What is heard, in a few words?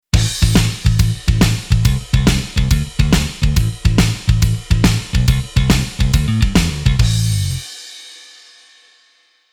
Bass Metal